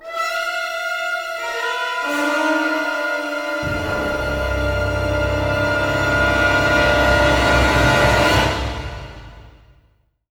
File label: FULL ORCA E; East West; Symphonic Adventures